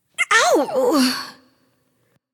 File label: ouch; smite; artemis